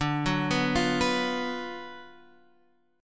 F sharp Major 7th Suspended 4th Sharp 5th, D, chord